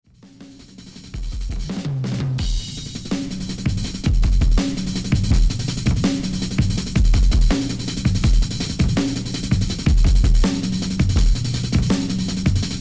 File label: scratch kit; beat junkie turntable tools